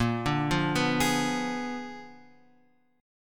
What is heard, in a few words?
A sharp 7th Flat 9th, guitar chord; A#7b9 Chord {6 5 3 4 X 4} arpeggiated